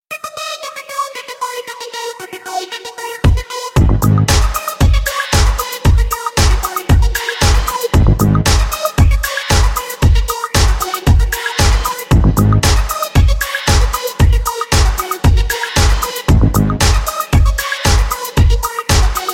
hip hop mix

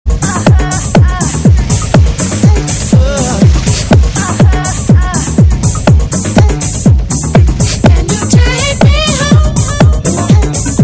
Rock Bass

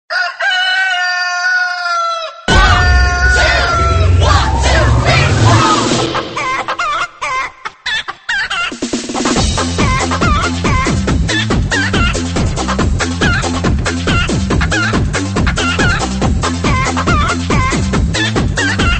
chicken singing

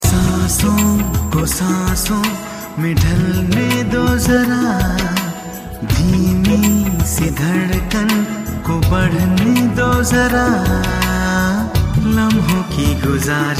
humming melody; classical

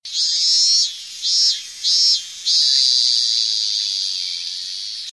Cicadas Chirping

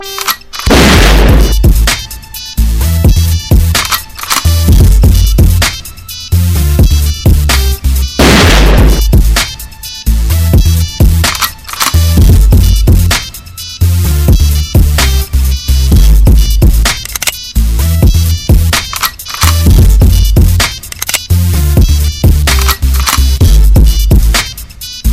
Kick Tune

